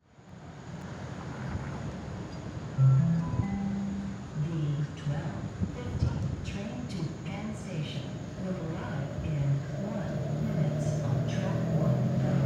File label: train arriving